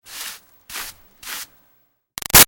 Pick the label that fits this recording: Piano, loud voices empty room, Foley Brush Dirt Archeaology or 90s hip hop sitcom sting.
Foley Brush Dirt Archeaology